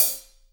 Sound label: HH hh; Best Service Gigapack 2